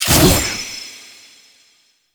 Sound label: Glaive Throw; Enemies; Weapons; Warframe; Teshin; DE